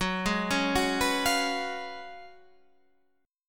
F sharp Major 7th Suspended 2nd Suspended 4th F sharp, Guitar; 15,12,12,12,13,14; Half Step Down